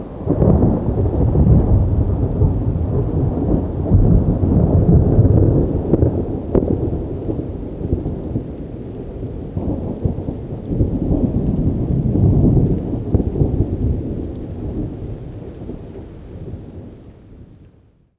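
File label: roll thunder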